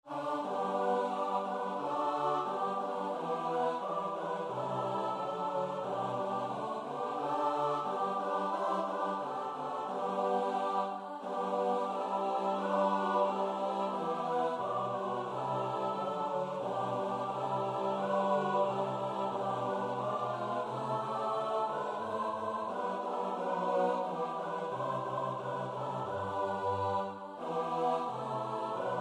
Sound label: midi; niech spiewa niebo cale